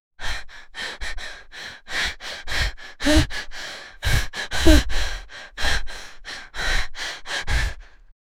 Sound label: heavy breathing panicked